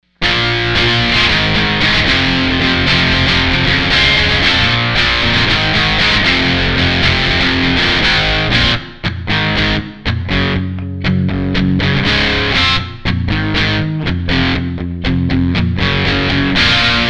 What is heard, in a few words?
classic crunch boost